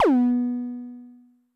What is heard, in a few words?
BD MOD Pitch Extra High, bass drum, 909; classic machines; 909mod